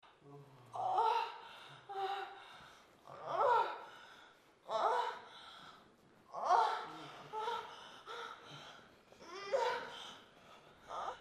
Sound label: sex, moans; The Witcher Hunt